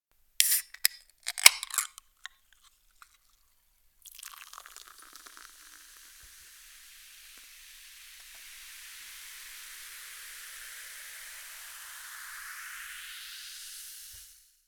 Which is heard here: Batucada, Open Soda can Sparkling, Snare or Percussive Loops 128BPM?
Open Soda can Sparkling